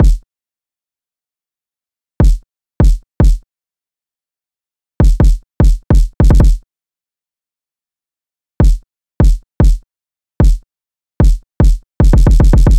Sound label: Drill Kick